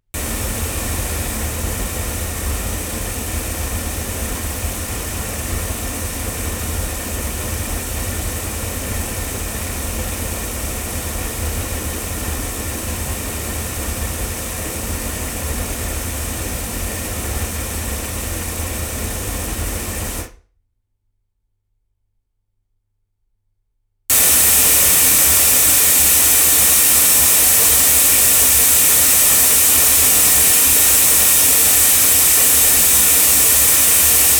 omni loudspeakers; studio projects

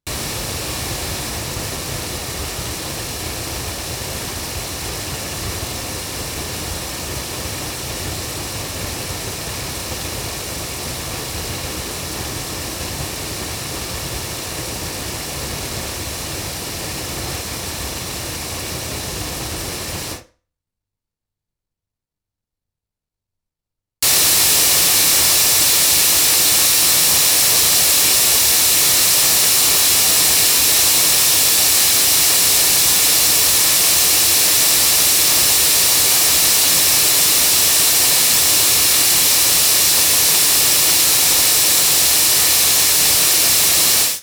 Generis GT2 Mk2 8 loudspeakers

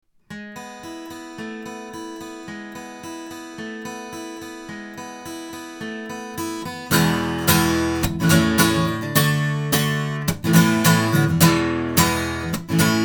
Earthworks M30 guitar, microphones

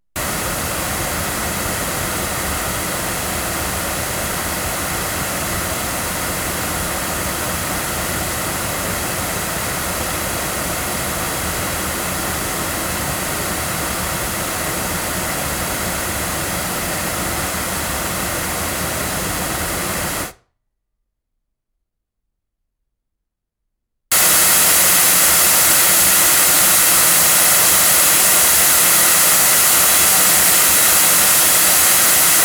AudioTechnica AT4033A loudspeakers

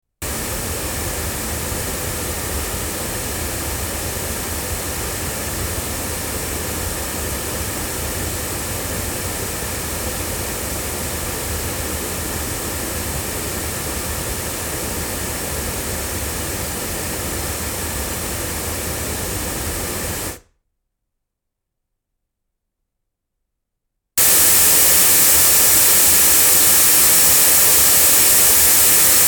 loudspeakers

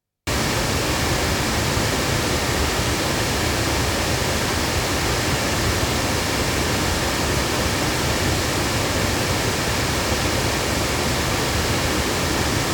front loudspeakers; CT NakedEye; crowley tripp

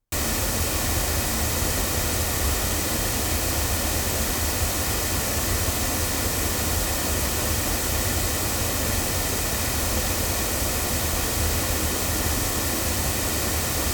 loudspeakers, microphones recordings

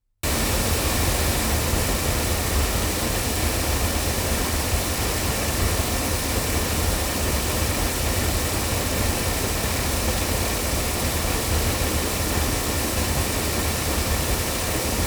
Nevaton MC48 loudspeakers

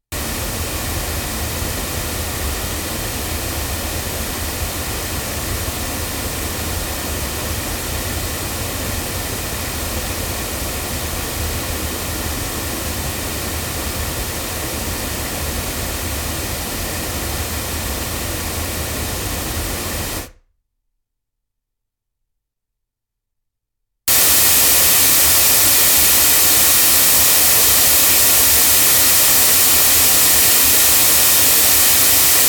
MXL 1006BP loudspeakers

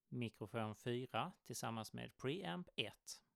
mic pre

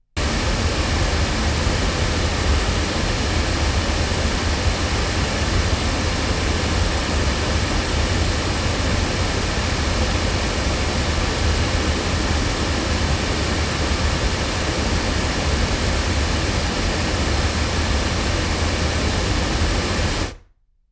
Sontronics Omega loudspeakers

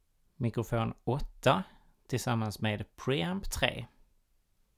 mic pre

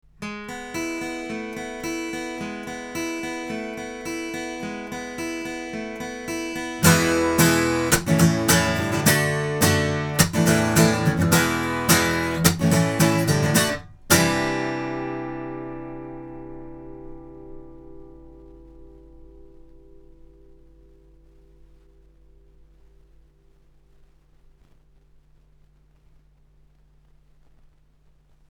omni guitar, microphones; Lauten Oceanus

